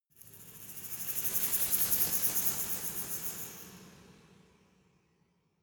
resonator rattle dynamic

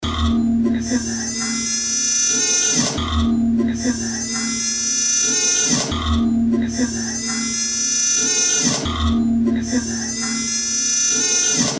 musical fx loop

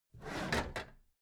packed drawer smooth clatter open heavy halfway

